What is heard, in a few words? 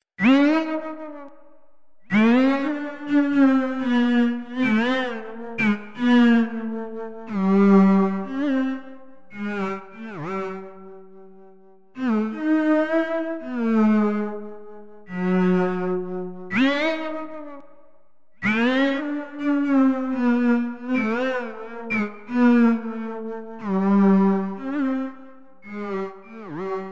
drum